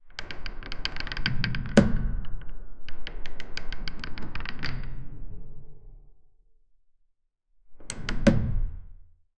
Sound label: short sound wooden chest, subdued